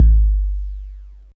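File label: bass drum 808 SP1200 G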